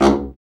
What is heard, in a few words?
BRA BARI, Bariton Sax